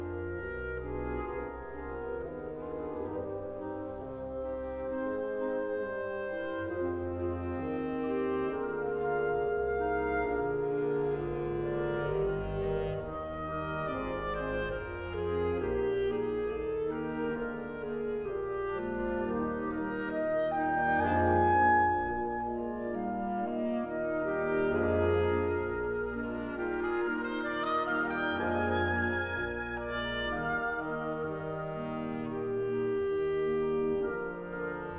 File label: Serenade in B flat major